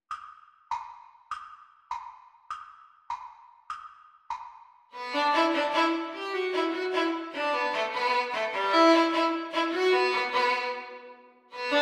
Mandolin Duet Exam Speed

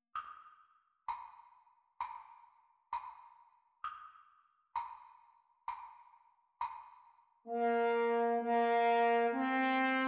French Horn Duets Playalong Practice; practise speeds

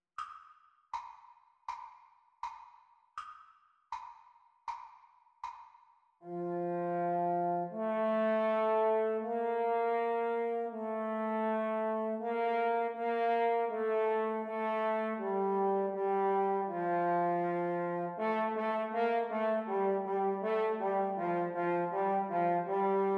French Horn Duets Playalong; exam speeds

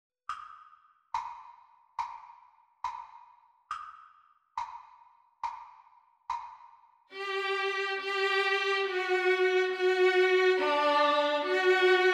Mandolin Duet Audio Practice Speed

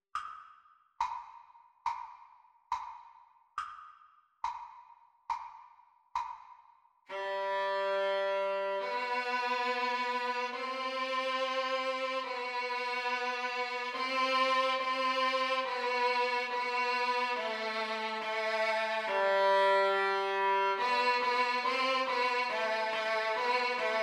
Mandolin Duet Practice Speed

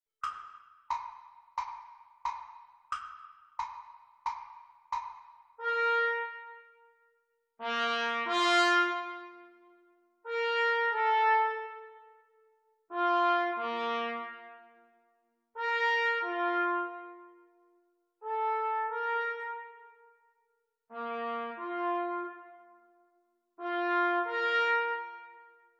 Trumpet Duets, Exam Speed; Grade 1; Cornet

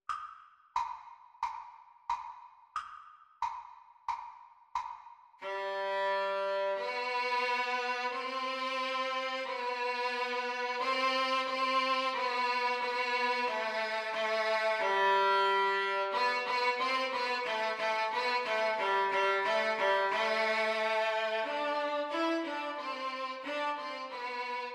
Mandolin Duet Exam Speed

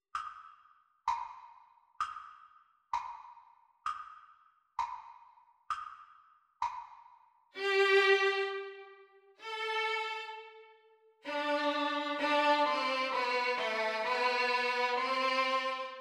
Mandolin Duet Practice Speed

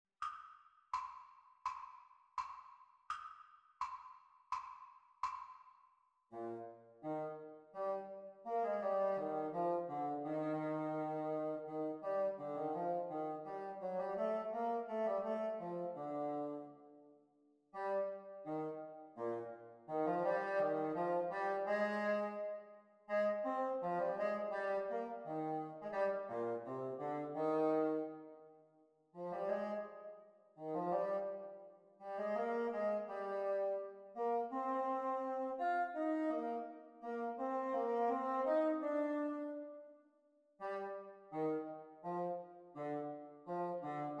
Basson Duets Exam Speed; bassoon

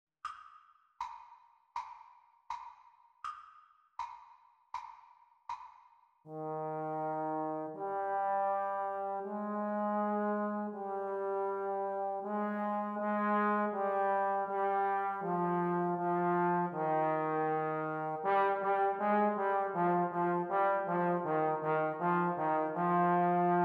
Playalong for Eb Horn Exam Speed; Eb Tenor Horn

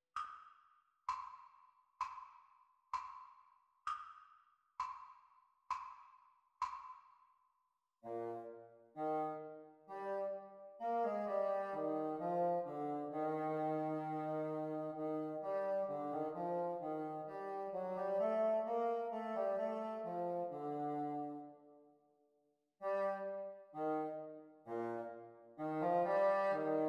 Basson Duets Practice Speed; bassoon

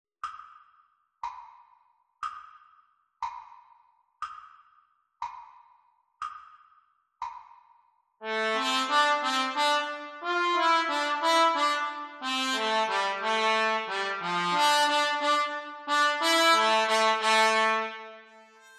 Trumpet Duets Practice Speed; Grade 7; cornet

